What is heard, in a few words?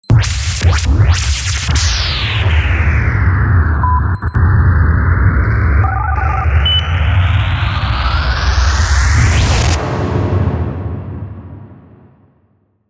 Gateway Sweep